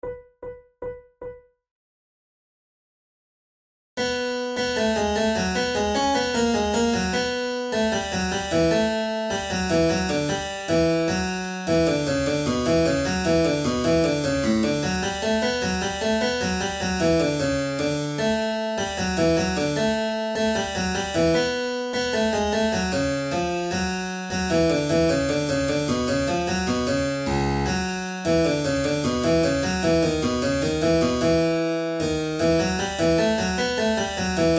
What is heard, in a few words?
flute sonata in b minor alla breve